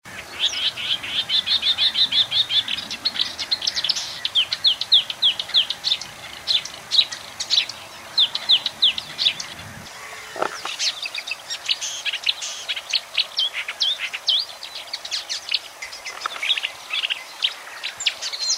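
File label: marsh warbler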